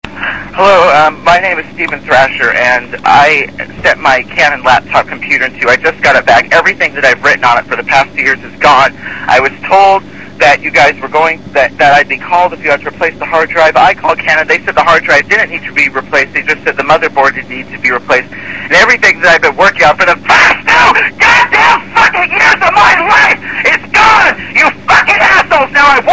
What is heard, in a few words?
computer crash